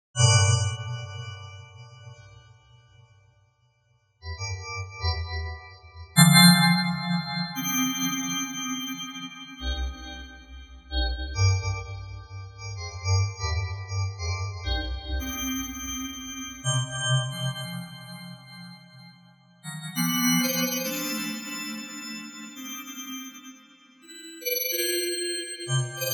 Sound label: PolyGAS